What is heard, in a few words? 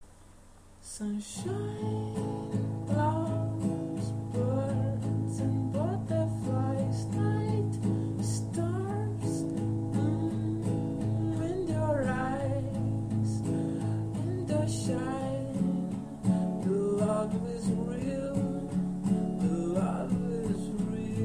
little folk